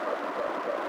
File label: Skid Road Heavy; LFS